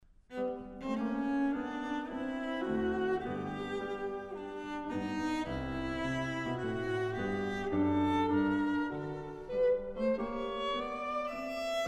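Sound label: piano trio; onslow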